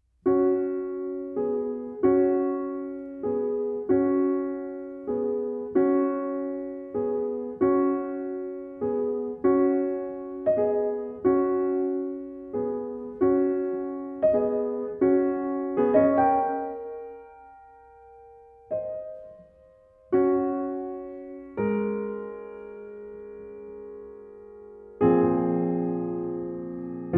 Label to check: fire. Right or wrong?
Wrong